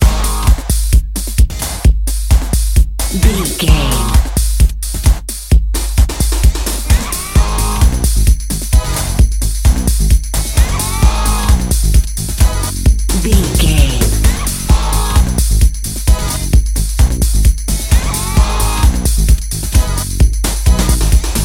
Dance, EDM, Club, Exercise, Aerobic, Aerobics, Spinning, Workout, Disco, Eurodance, Confident, Energetic, Cheerful, Happy